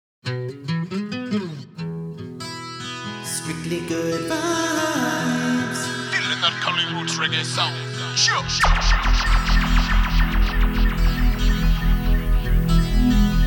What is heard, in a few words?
Cali roots reggae song